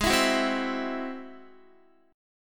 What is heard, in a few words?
Ab Augmented 7th Ab down Guitar; Ab+7 strummed; JGuitar